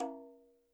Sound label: KILLER CONGA